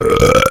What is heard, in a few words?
heavy pain sharp; tf; duke